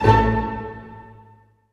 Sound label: STAC A F, orch stac; Sound On Sound - Peter Siedleczek's Orchestra